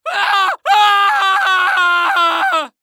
Horror Scary Human Male Scream Nervous Terrified Scream